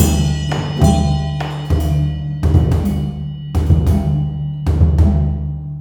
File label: MALAY L, Heart of Asia